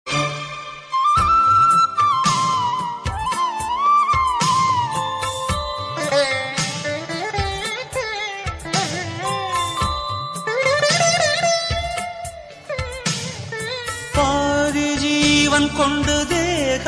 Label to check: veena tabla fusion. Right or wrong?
Right